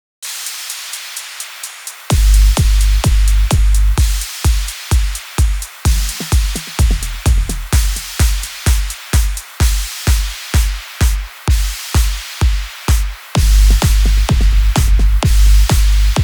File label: RAVE